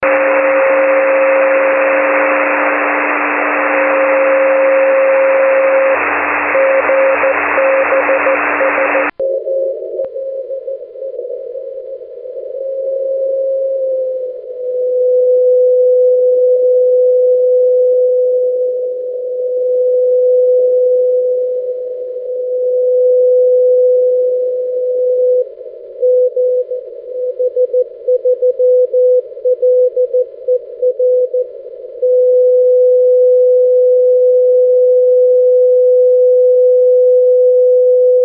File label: 6m, GB3LER beacon; GB3LER beacon (8 June, first time ever at my station); 6 Meter; N1BUG